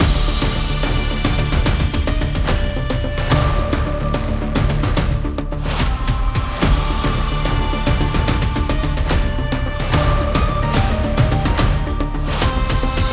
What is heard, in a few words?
Library Trailer